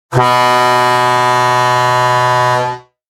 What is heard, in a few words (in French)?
trompe base rectangulaire chrome klaxon inox son; hadley; longue 95cms